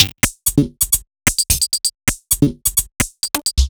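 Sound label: Odd Glitch, 130 BPM, Percussion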